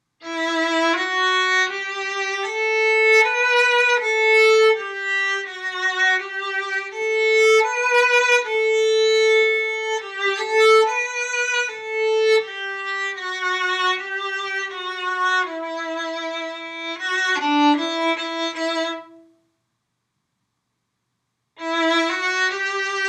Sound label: violin